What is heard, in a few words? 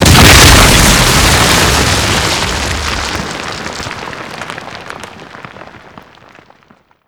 explosion; killstreak explosions